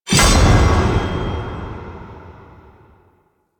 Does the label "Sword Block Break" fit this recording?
Yes